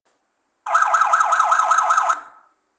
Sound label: yelp, sirene; 27C10 NOBREAK